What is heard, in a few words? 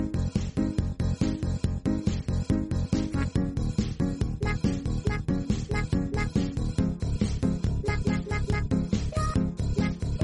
background song vibes